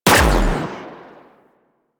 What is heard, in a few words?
Briefcase Akimbo Pistol Alt Shot; Warframe; Weapons; Corpus